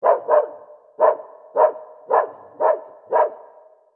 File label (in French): chien; El Rey Arturo